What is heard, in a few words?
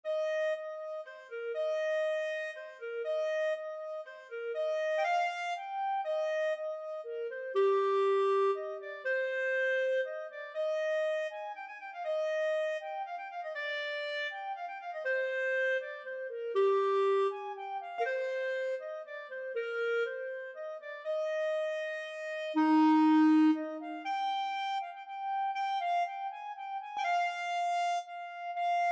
clarinet; fairy queen irish